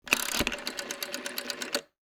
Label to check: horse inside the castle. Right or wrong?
Wrong